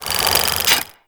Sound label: Speargun Ready, Weapons; Warframe; Tenno; Priest Spear